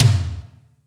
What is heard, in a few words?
Toms, Real